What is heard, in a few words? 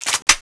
clip in; weapons; cstrike